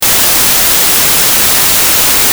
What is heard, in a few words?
whitenoise